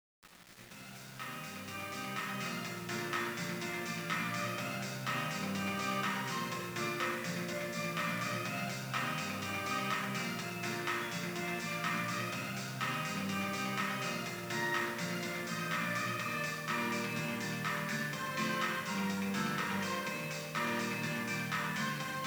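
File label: background music karaoke; usen; yakuza ryu ga gotoku kiwami 2 pc gamerip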